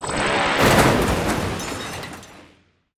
Death Underlay; Mimiron

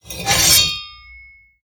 2H Axe Underlay Play

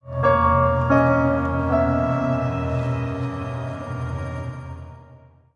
Play Stinger

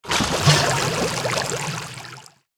Cauldron Underlay Attack

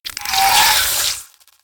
Boneweb Spider Attack

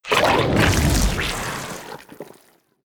Floating Watcher Death Underlay

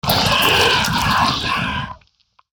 Bloodworm Death

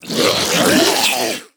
Stubborn Gastropod Death

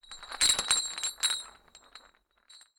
Large Chains Attack

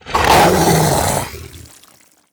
Infested Gnoll Attack

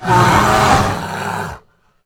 Darkmire Moonkin Death

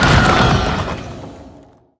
Golden Kobold Death

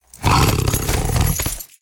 Armored Tiger Attack

